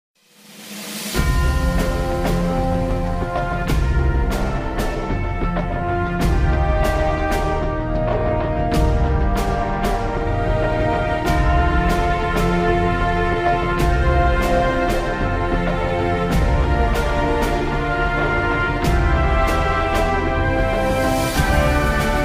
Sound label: Victory